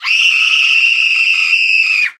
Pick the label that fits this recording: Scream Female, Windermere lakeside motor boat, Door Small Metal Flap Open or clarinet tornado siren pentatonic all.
Scream Female